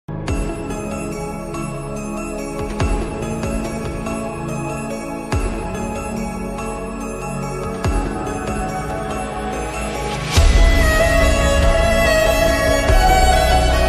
carol of the bells slowed ringtone

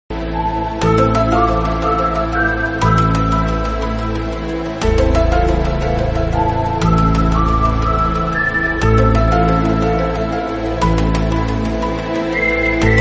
instrumental bgm ringtones; the kashmir files

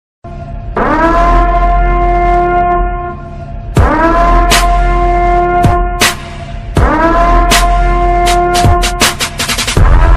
boosted bgm ringtone attitude bass

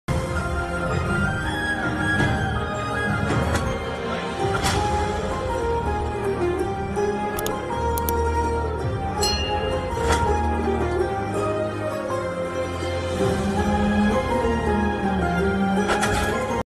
sad BGM ringtone; gopichand

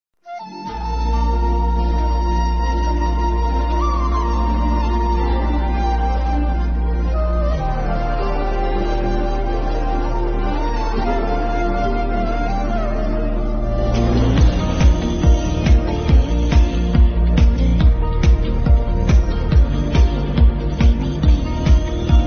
SONG BGM; amaran

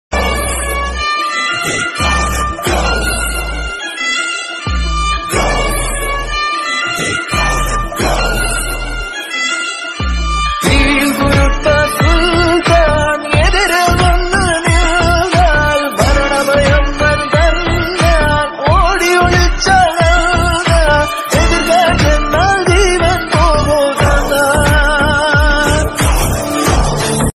Kannada song ringtone; ghost

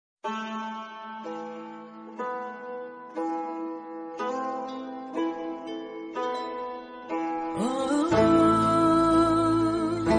turkish ringtone, sad ringtone; kurulus osman

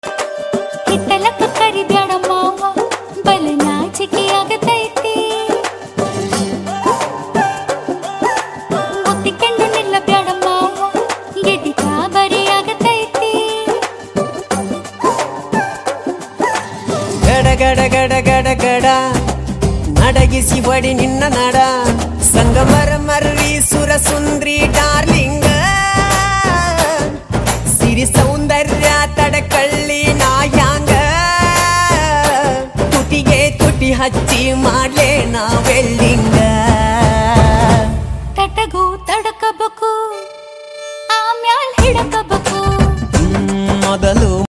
funny comedy song

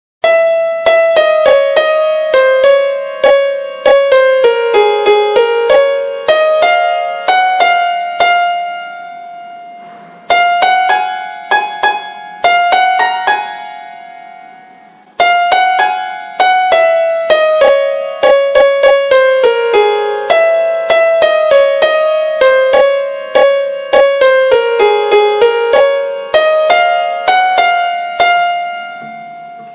dj remix hindi instrumental ringtone; tik tok ringtone

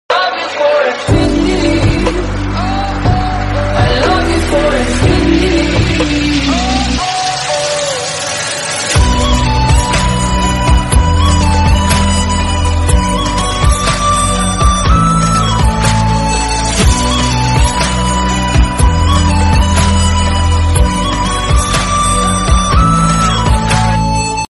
flute ringtone

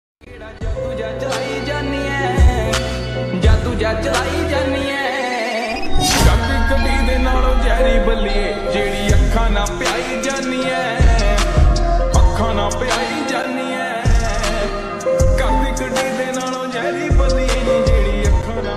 Song Ringtone, Punjabi Ringtone

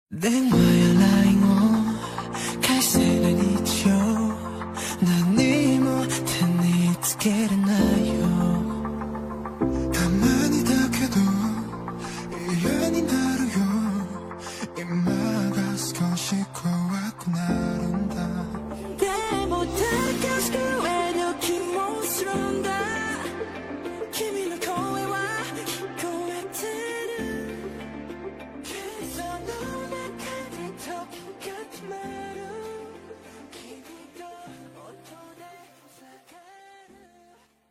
english ringtone bgm attitude song

